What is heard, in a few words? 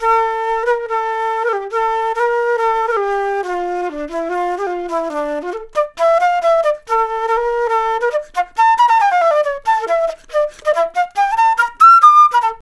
Flute Solo, Jazz